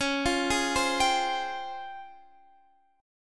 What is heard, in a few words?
C sharp Major Sharp 11th; C#M#11; arpeggiated